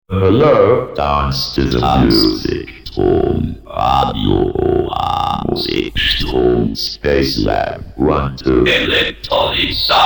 Elektrik Voice

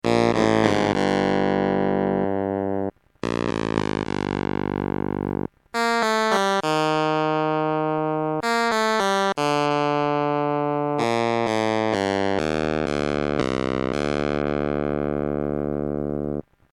arp pro soloist country guitar

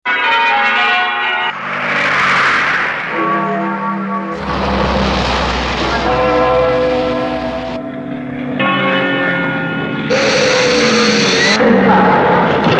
environment

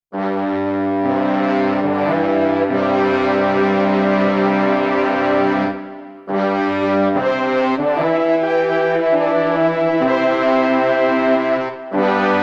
Muted Brass

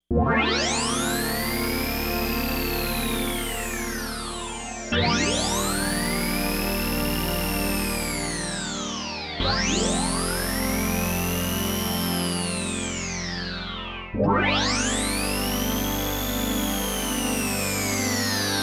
pure analog; obx8

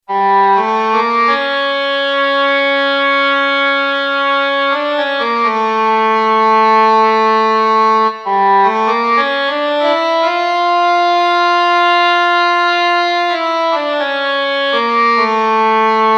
Cor Anglais Oboe

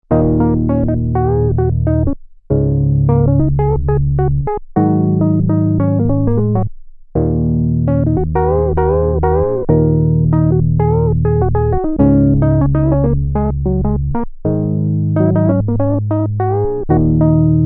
Note bends, sequential

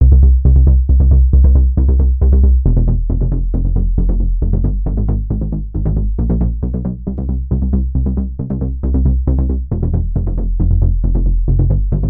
Runner Bass, sequential

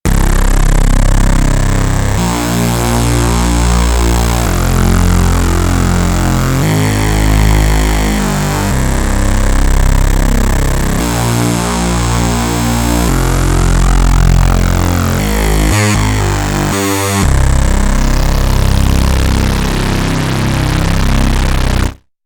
Monster Uni; sequential

